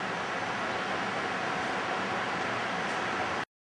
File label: Black-throated Blue Warbler Female